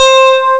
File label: yam lead; Amiga Soundtracker Sample Packs